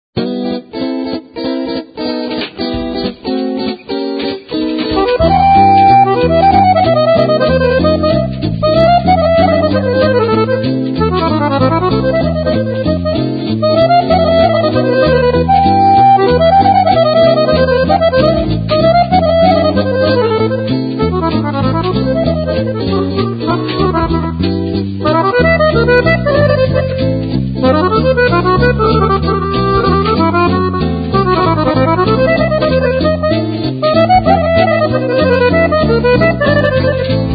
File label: Romanian lautari